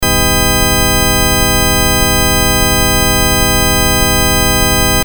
Multi Sine; LG KS20

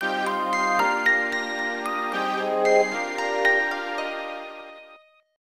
Orgel, notifications; Fujitsu F-42A

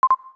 notification, Samsung phone system; SGH-T369 Linx; Paragon UX

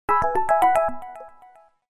A toy watch

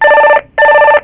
ring

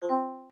system browser start; BlackBerry OS-10

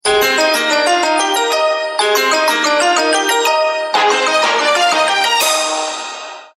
Ringtone; Samsung; SGH-A187; ATT

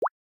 Water Drop Notification Night; Xiaomi Redmi-Note-8-Pro

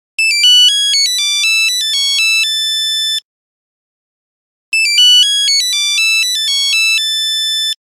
Nokia Buzzer Nokia tune; Windows Phone apps

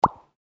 small Bubble; LG GD900 Crystal; Game Sound

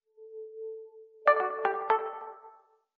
moto start; Motorola Q9c

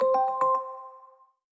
system notifications

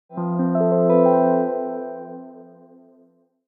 Alert

